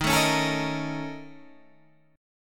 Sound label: A sharp Minor Major 7th Double Flat 5th D sharp